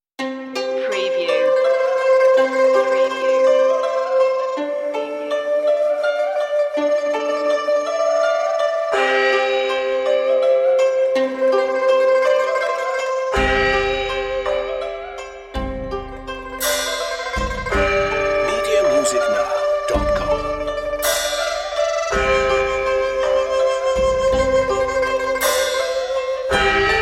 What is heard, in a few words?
chinese waltz